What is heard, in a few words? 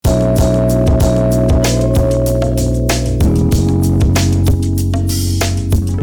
clipped